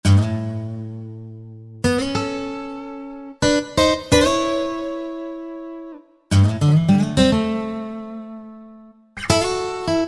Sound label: Guitar